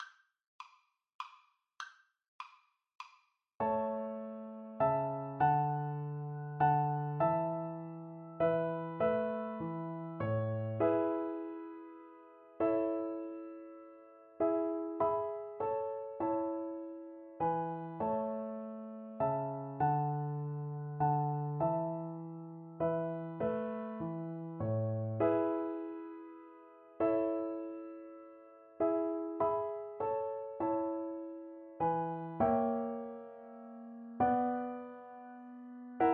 minuet, Viola

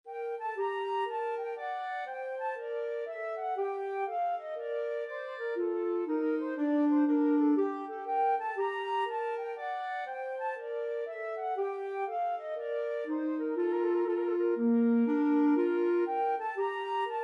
bouree, flute clarinet duet

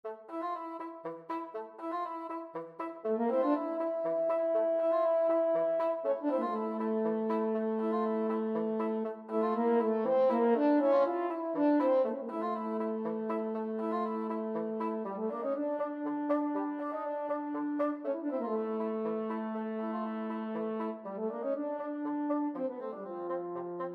french horn bassoon duet

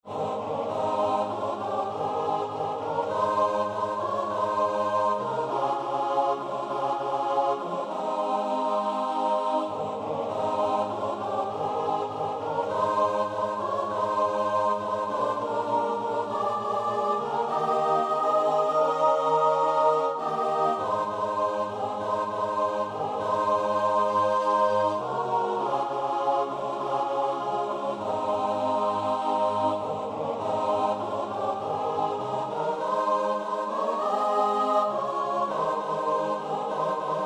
choir; jesus came

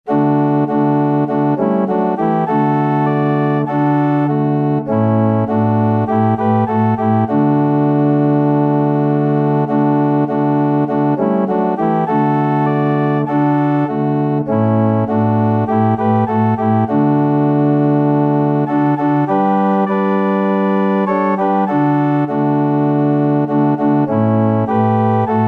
organ

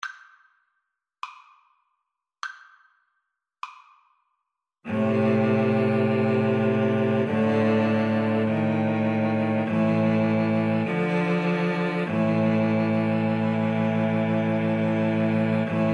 Cello Trio; bruckner aequale1

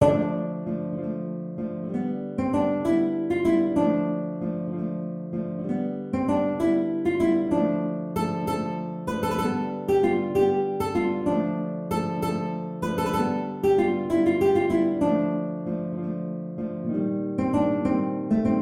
Siciliana two guitar

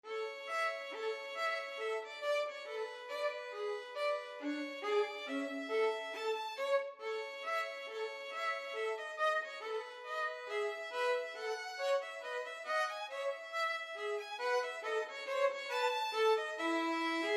violin trio; schubert rose

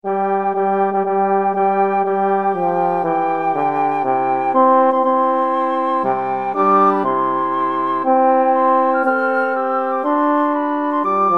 wedding march, flute clarinet horn

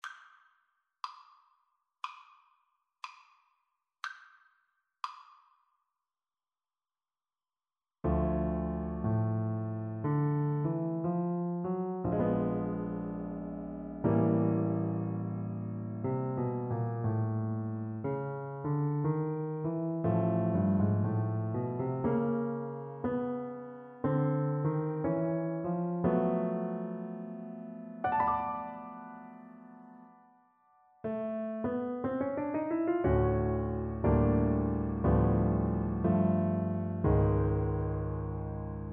happy jazzy birthday, Double Bass

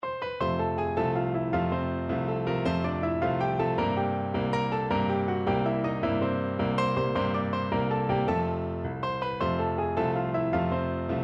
mexican hat dance piano

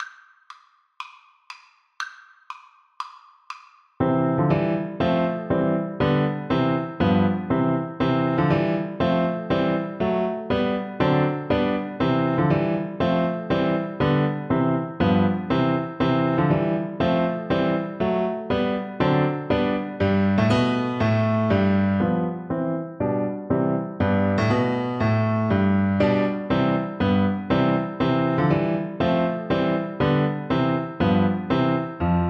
schumann soldier, Viola

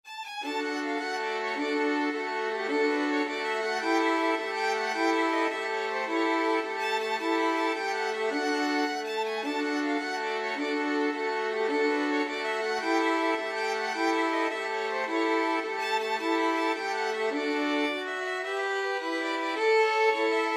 violin quartet; mexican hat dance

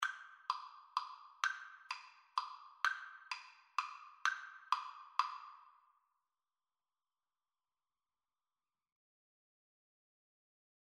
Chopin Waltz 1 Op 18 flute

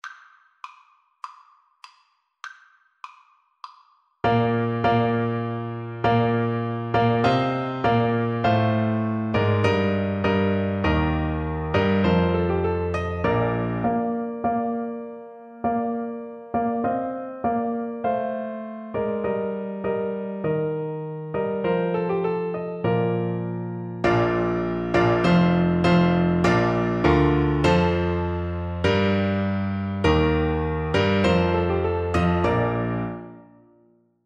boars head BN, Bassoon

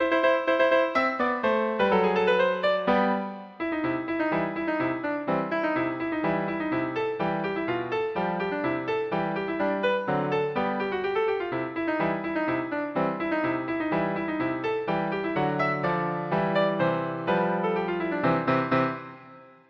radetsky march, piano

